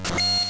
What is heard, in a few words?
gleam; GBA; MLSS